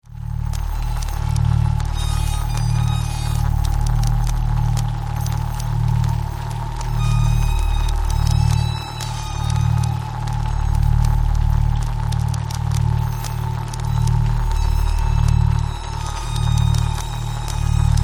Horror Laboratory Metal Squeaks Wind Electrical Arcs Water Boil; Scary Soundscape